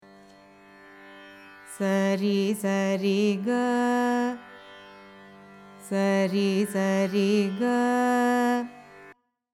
revagupti, carnatic music

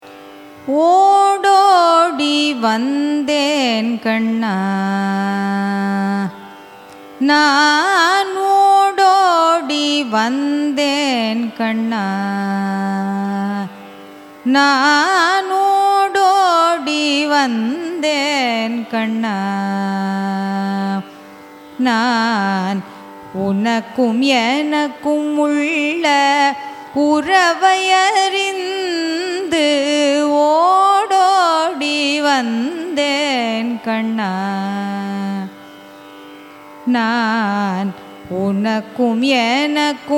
raga dharmavati carnatic

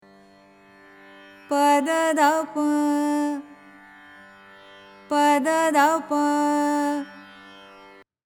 sivaranjani, carnatic music

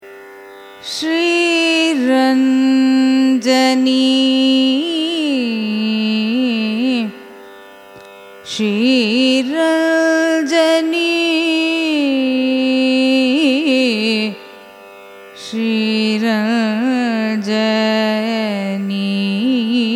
raga sriranjani signature, carnatic music

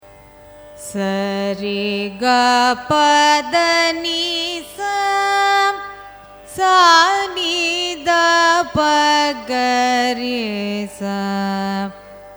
raga malayamarutham arohanam avarohanam; carnatic music

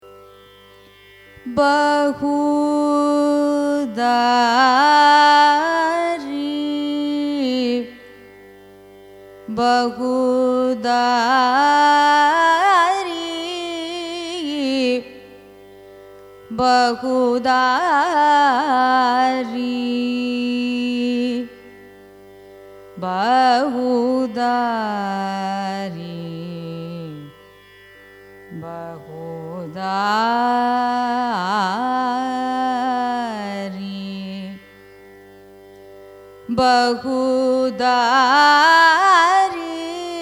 raga bahudari signature; carnatic music

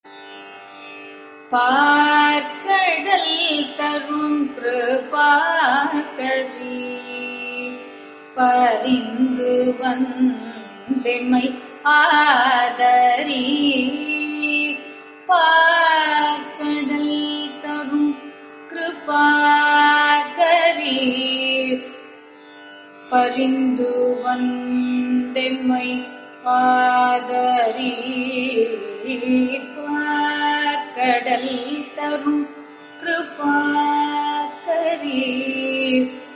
neraval, carnatic music

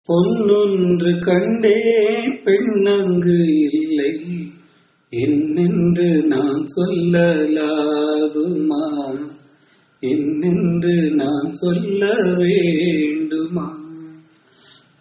raga brindavana saranga cine

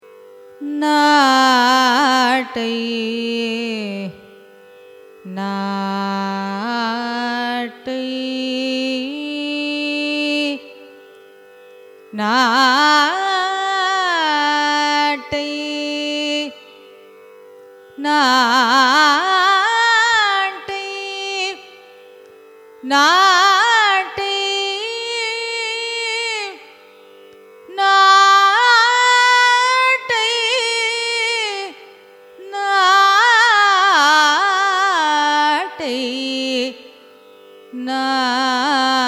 raga nattai signature, carnatic music

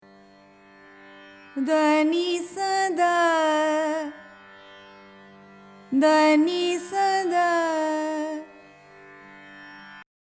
hindolam, carnatic music

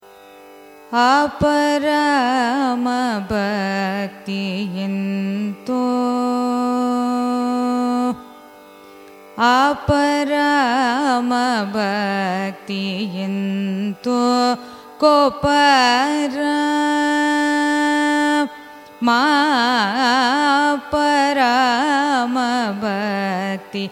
raga pantuvarali carnatic; carnatic music

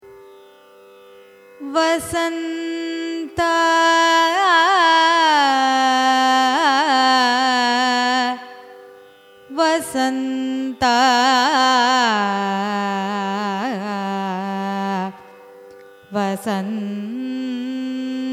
raga vasantha signature, carnatic music

